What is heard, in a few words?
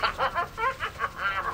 Giggle